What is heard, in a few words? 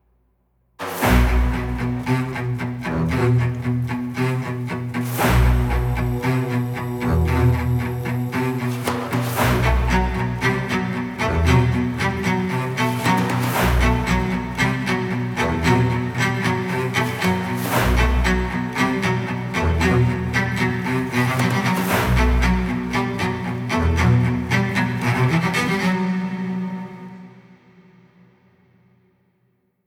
Boss Fight